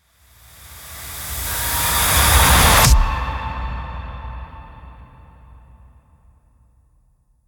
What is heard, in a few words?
Main Ambient; Blood Harvester